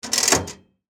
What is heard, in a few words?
Turnstile Turn, Sports, Turnstile, Entrance, Turn, Clicks; Miscellaneous Sport Sound Effects; BlastWave